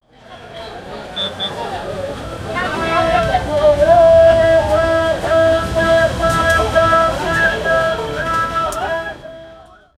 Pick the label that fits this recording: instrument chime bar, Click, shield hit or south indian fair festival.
south indian fair festival